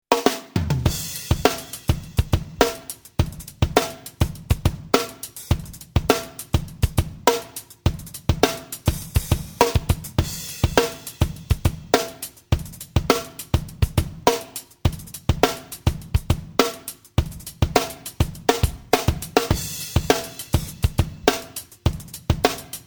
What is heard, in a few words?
Drums DBX